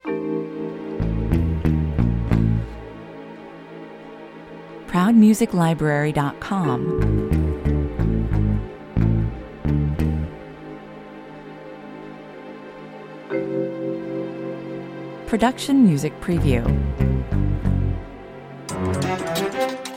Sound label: cat and mouse game caper 90 bpm